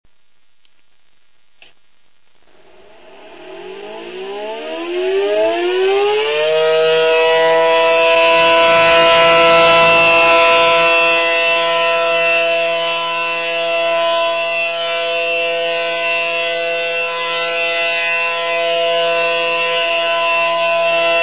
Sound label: Alert, sirens